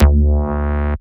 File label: Bass Shot D